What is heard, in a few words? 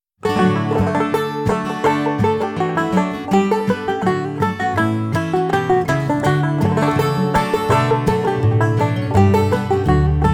banjo fragment; Irska pred snidani